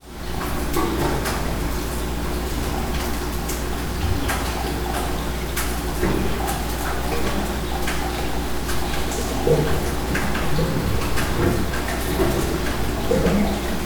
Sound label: Drain Sounds, binaural